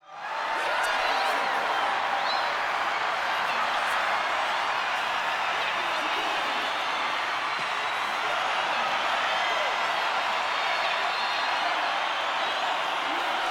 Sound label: horse